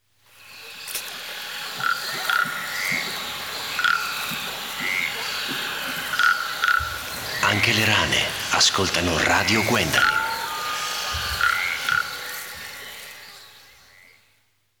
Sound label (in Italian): Jingle rane